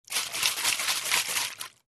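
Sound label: sounds of hairspray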